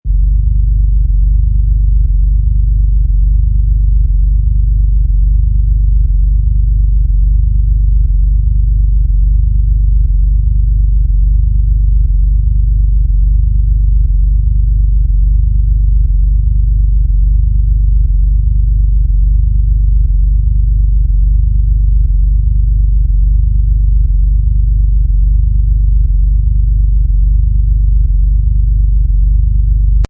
Deep Engine Bass, Deep and creepy evil bass sound LOW FREQUENCIES; Deep and creepy evil bass sound LOW FREQUENCIES: USE HEADPHONES OR SUBWOOFER TO DEMO.